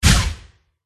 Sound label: dragon mage attack contact bottom